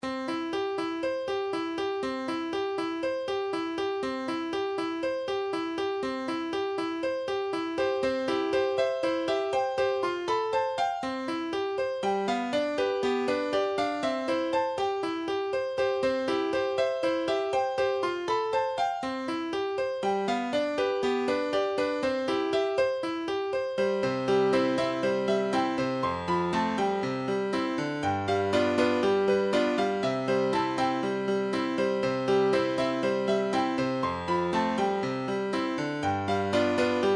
music box

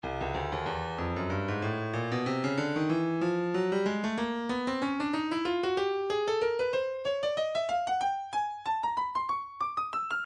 stylus breakbeat midi 094 bpm; Head Knod

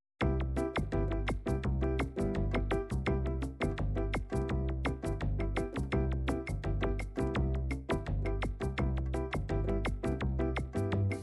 bossa nova, midi

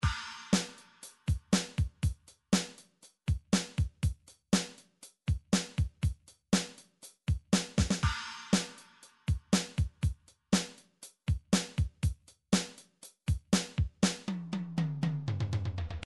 drums 8th rock, midi drums

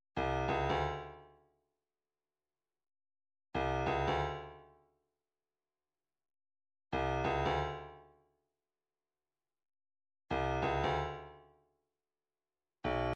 142 snare

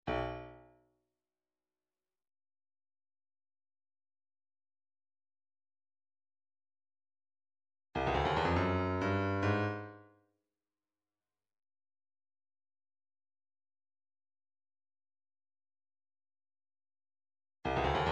132 dumbek fill

